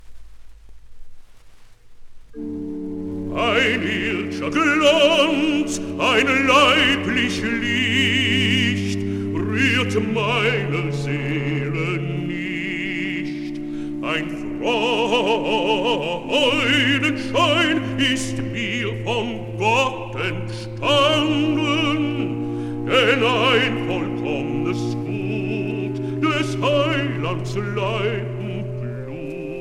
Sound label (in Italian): recitativo; bach; bwv1